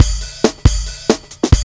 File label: drum loops; bb drum loops